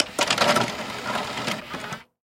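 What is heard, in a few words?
sounds of CD player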